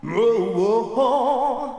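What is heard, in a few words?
voice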